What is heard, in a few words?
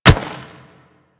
Kubrow Step Snow, Footsteps; Warframe